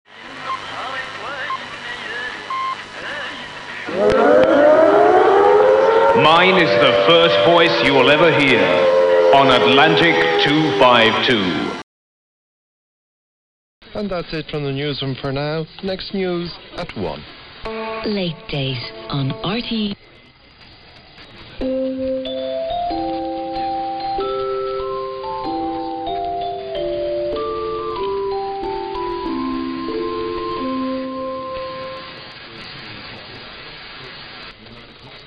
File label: audiofile start and end with jingle; RTE